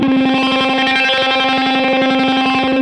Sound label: Hondo Fuzz 170 C, guitar drone